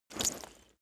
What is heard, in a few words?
leather metal movement soft single